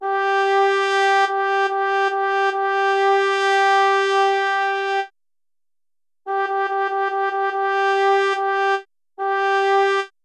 simple rhythm in 6 8

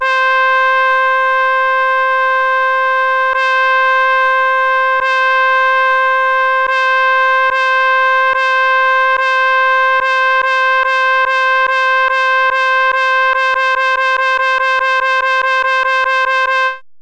note and rest values

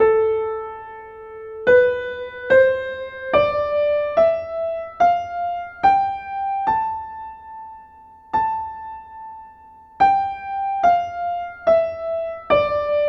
aeolian

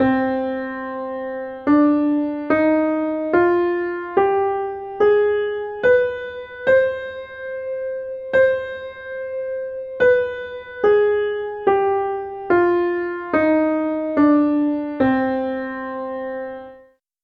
harmonic minor

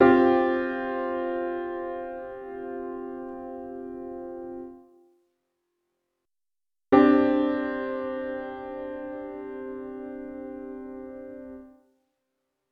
suspended chord tones

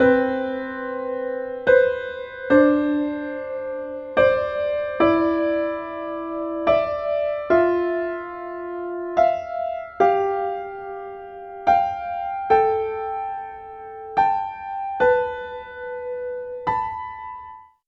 basic sevenths

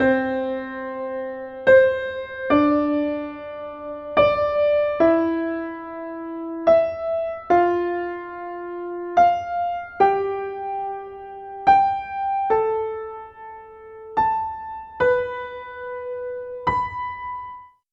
basic octaves

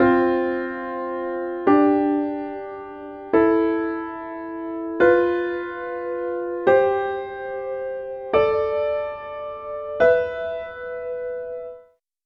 basic fourths

